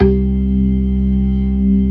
ORGAN, C3 PERC; Keith Emerson Signature Series

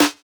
Snare, jungle breaks drum sounds; ORIGINAL JUNGLE BREAKS; JBK DRUM SOUNDS; JBK SNARES

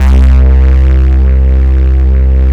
M.BASS C2, M.SYNTH; AKAI S-Series CD-ROM Sound Library VOL-7

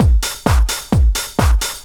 drum 130 techno; Techno Trance Essentials

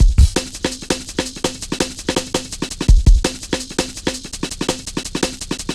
Rollin Shaker, Drum Loops 166.5; Zero-G Jungle Warfare 1, 2 & 3

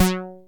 TEISCO, BASSES; Masterbits - Vintage Voltage; VV BASSES

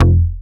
One Shot Bass

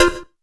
SYN FX; AKAI S-Series CD-ROM Sound Library VOL-5; FX PERC

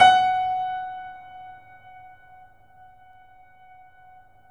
Piano, YAMA SUSTAIN; InVision Interactive; The Piano

